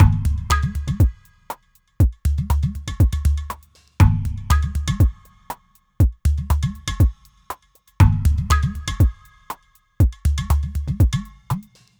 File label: Terrestrial Hybrid, Processed Percussion